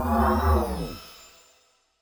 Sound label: wave teleport down